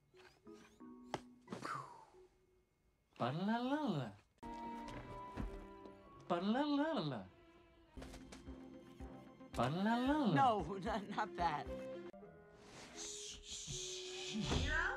balalala

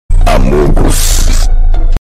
calm nature sounds

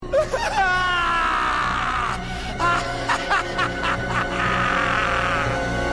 laugh; boingo; hoodwinked

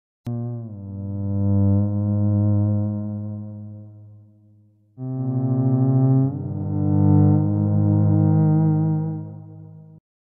Sad Depressing

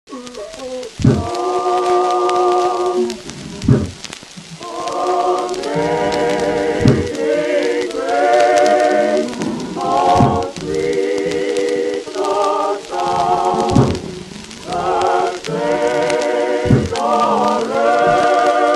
Scary Sound

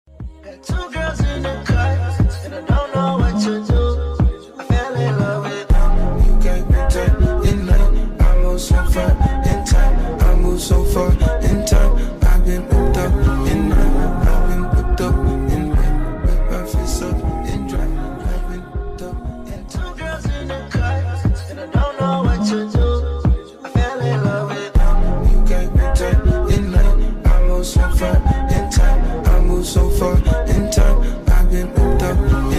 New Drop x Trance loop

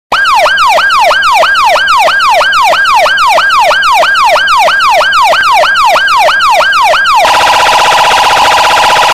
Police Siren

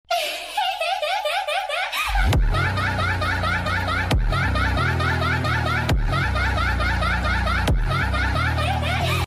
laugh

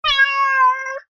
Cat meow but Social Media

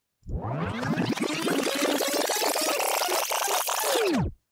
rewind

